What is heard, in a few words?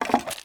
bucket foley; movement; tusk